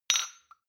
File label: shot glass clink bounce medium; soundpool